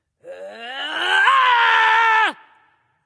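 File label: battlecry, female; smite; voicepack; hera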